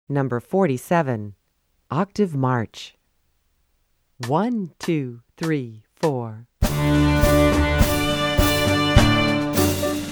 Octave March; New Directions for Strings Book 1

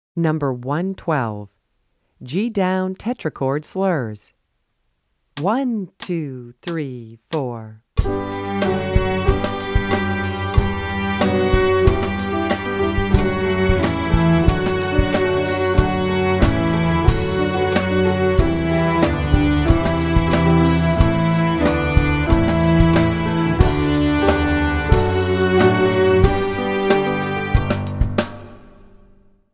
G Down Tetrachord Slurs

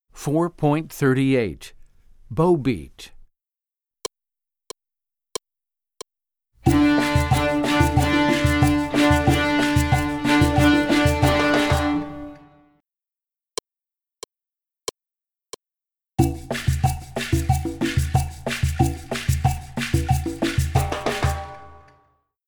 Bow Beat

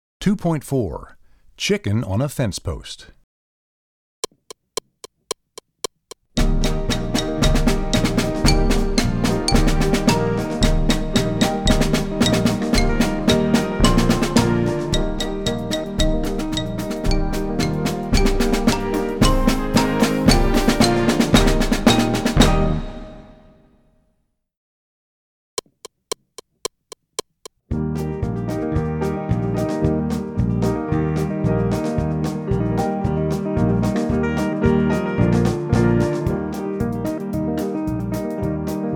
Percussion; Chicken on a Fence Post; Percussion Book 2

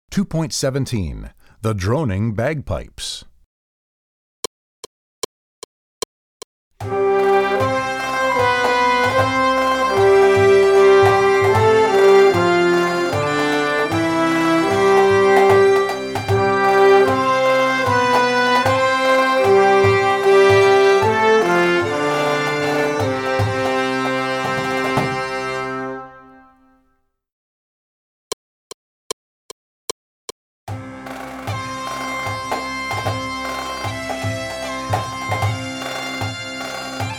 The Droning Bagpipes

